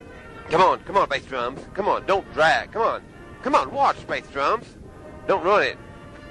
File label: bassdrums